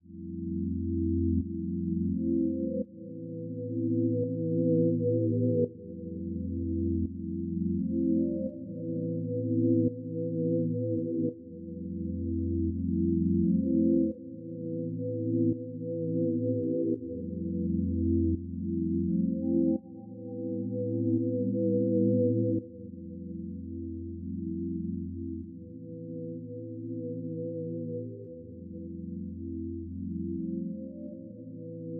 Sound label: 170 BPM C Min Pad; Loop Stems; Cymatics; Utopia